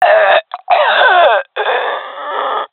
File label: death, jungle fem; vo; agents; Source Model Packs; Counter Strike Model Pack; CS2; jungle fem epic